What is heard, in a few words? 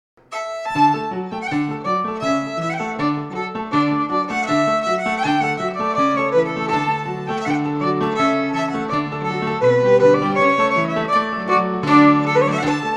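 Quick Step, Scottish Fiddling; Morrison 1797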